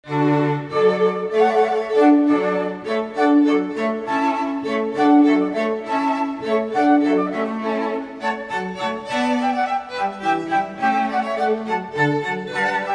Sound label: Hornpipe, Flute Quartet; Water Music